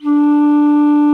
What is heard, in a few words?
ALTO FLUTE D4, WOODWINDS; Greytsounds VOL-1